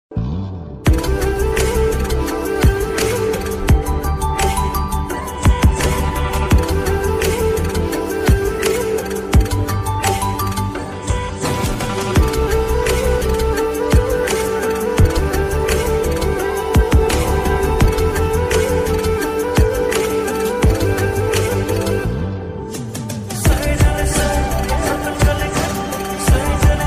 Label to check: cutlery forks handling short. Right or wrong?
Wrong